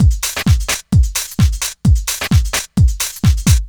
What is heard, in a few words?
DRM 130, LOOPS 130, House; Best Service ProSamples vol.10 - House; AKAI